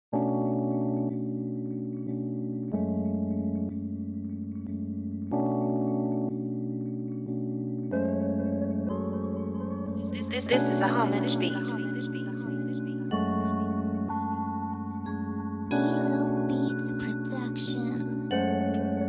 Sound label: RAP